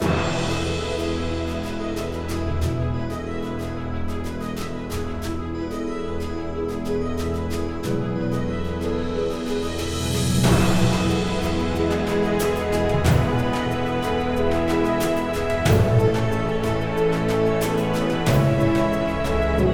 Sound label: Game won; Age of Wonders III